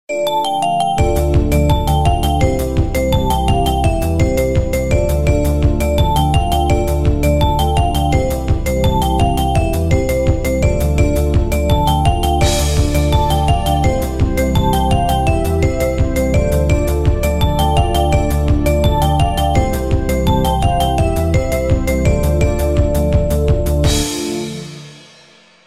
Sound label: Simple Melody